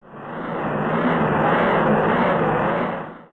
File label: Morpha Lurk; Zelda; OOT